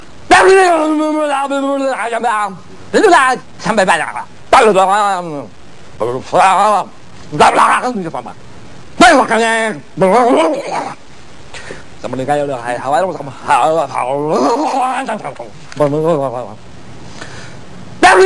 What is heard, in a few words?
Nose Sounds